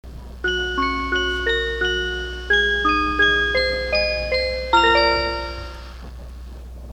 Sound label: chime